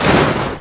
ROLL, Prangs

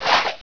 Flip; Heretic II; monsters; assassin

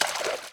Water Hit; 1944 Battle Of The Bulge

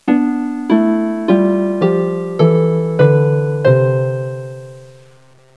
Harp; Music Game

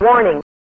warning; Falcon4

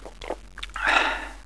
potion Drink; Mythos

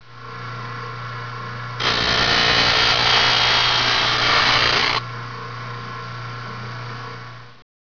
sander

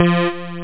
Magical Wind

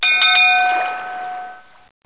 ocean

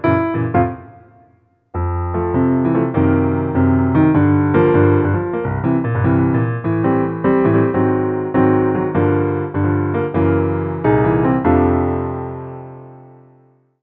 JAZZ RAP; MOBICLIC 57

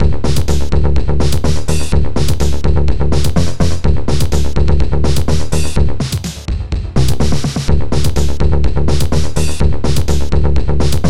techno slam; OctaMED SoundStudio V1; mug-members; tom cozzolino

